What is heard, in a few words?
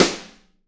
SD Real, Roland; Sound & Vision - Gigapack I